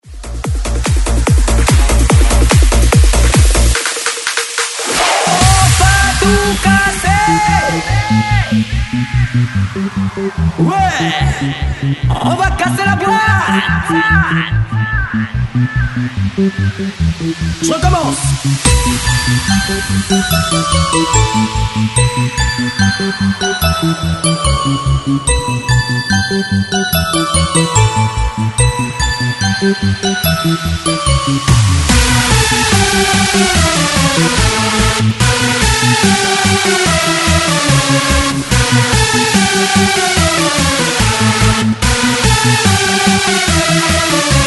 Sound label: Bounce Smasher; DJDOLLS